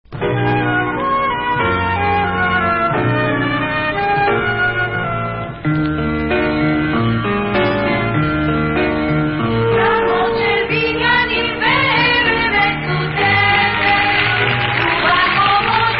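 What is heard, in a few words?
VOCAL, Israeli Dances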